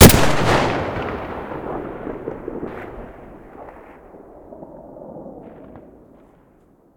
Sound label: awm muzzle stereo, weapons